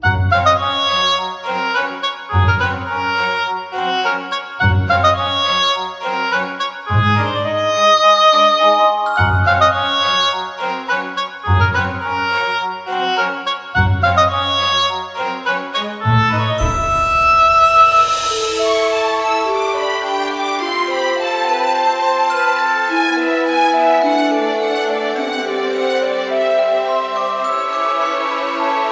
Sweet loop, BGM

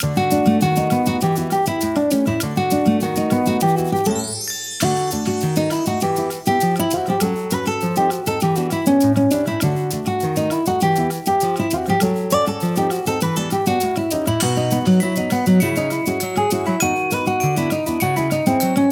Sunlit Cafe loop, BGM

